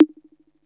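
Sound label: BONGO UP; Music Store Sound Service; Einstein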